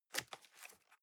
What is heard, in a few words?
cardboard box open unfold single; objekte